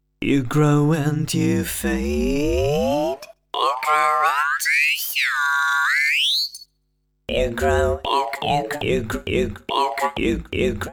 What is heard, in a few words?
Pitch Warp; WAVeBOY Industries